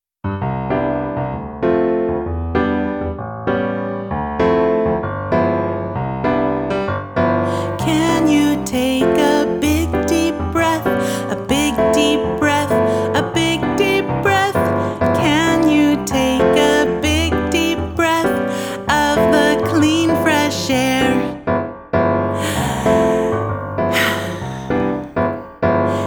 Big Deep Breath

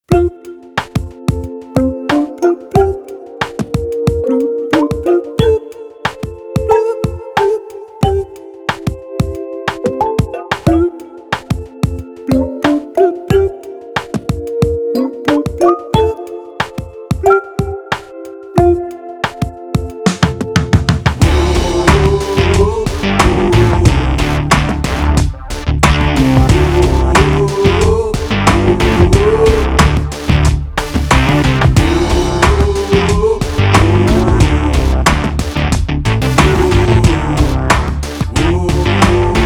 Fish Bubbles Instrumental

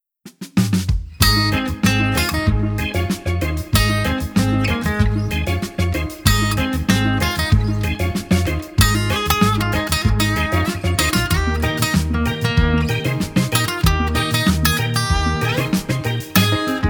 Baby Turtle Tempo Instrumental

